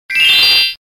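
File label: Instant Message; Yahoo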